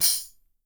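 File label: tambourine, left; Miroslav Vitous - Percussion & Harp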